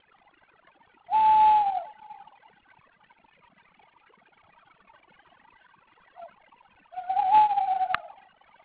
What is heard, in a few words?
tawny owl